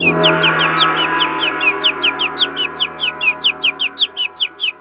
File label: two signals